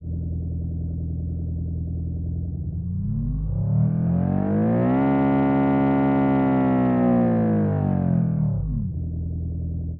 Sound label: i4 japanese, engine sound